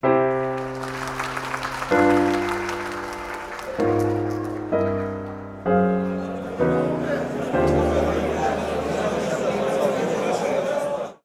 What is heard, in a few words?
kadenz chromatic